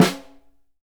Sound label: SNR, Synclavier Percussion 2 - World & Orchestral